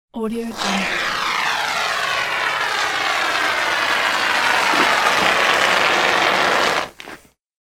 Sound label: applying foam glue; industrial sounds